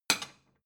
plate small shelve

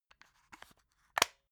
plastic remote battery compartment close

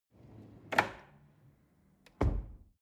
drawer cutlery close draw short

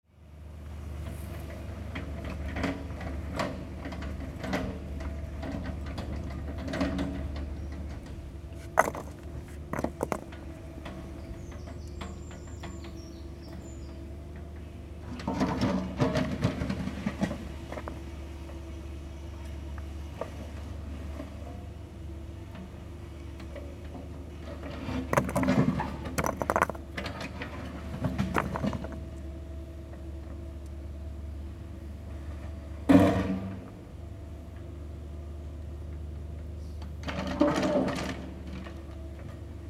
construction site atmo bricks and debris

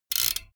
bmx bike crank freewheel chopped short

